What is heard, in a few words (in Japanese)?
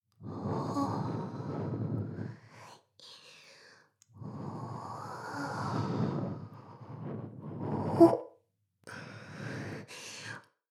耳舐め; 全妹計画２; 【メスガキ妹】わからせてくれるのよねぇ～?おにぃ～・ちゃん♪【全妹計画<オール・シスターズ> 2妹め】